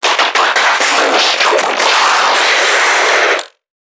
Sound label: NPC Creatures Vocalisations Infected